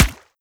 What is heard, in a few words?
water, bullet impacts; physics; Half Life Model Pack